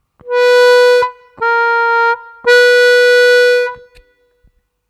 3-3′-3 отрывисто, губной гармошки

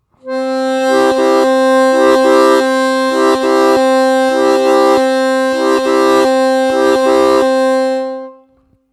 двойной ритм на октаве +1+4, губной гармошке

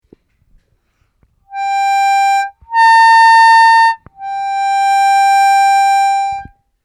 +6+6o+6, овербенд, губной гармошке

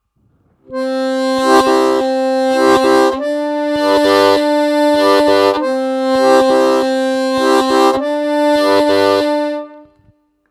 двойной ритм на октавах +1+4 и -1-4, губной гармошке

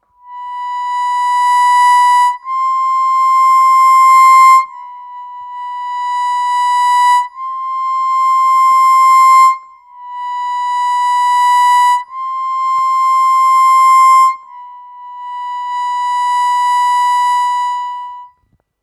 7+7, губной гармошке; одиночными нотами

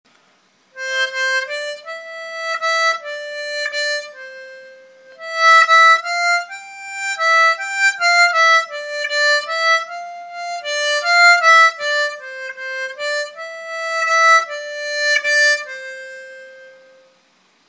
губной гармошке; Горіла сосна, палала

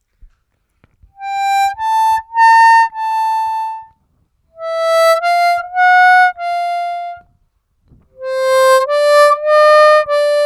+6-6+6о-6 / +5-5+5о-5 / +4-4+4о-4 / +1-1+1о-1, овербенды, губной гармошке

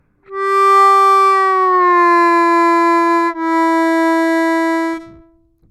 плавно -2 -2''+2, губной гармошке

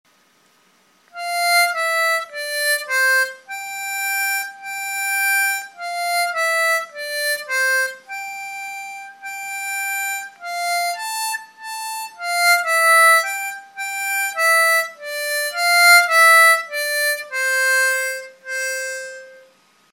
губной гармошке

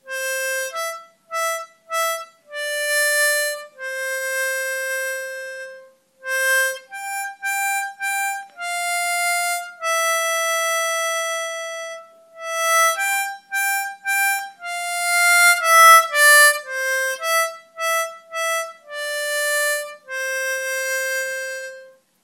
Украинская народная песня «Несе Галя Воду», сыгранная одиночными нотами, губной гармошке

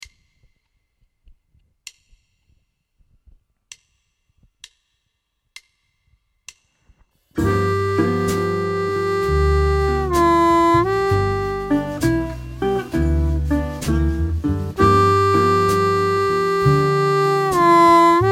Blues harp, Медленный пример; Играть блюз; 2-2''-2 / -2-2''-2 / -4+5+6 / -2-2''-2 / -1 / +1 / -2-2''-2

